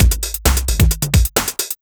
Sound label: Acebase, Funk Beats; Sonic Foundry (Sony Creative Software); Synchro Funk